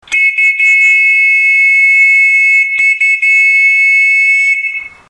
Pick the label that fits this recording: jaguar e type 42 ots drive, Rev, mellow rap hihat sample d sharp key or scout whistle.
scout whistle